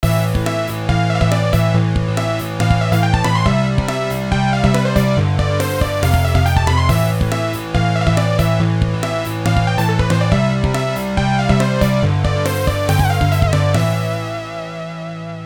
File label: level win